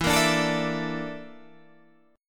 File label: Bb Major 7th Flat 5th